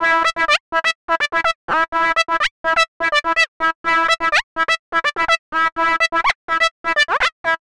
125 Fluty Blipps E, Keys, Nu Jazz House; PowerFX - Nu Jazz House

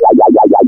A#, FX; Big Fish Audio

